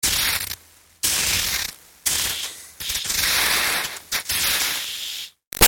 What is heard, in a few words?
Sizzle Iron Electrical Zaps Many; Zaps (Sound Effects:Electronics); Big Room Sound